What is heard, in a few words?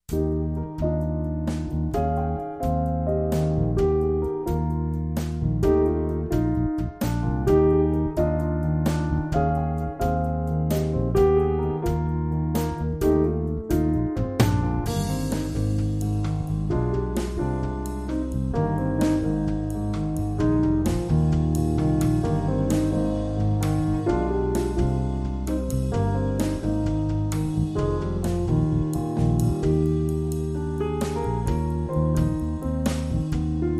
With Trio